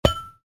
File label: Glass Clang